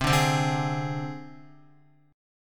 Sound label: C Suspended 2nd Sharp 5th, down, Guitar; Csus2#5 strummed